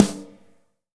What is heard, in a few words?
Snare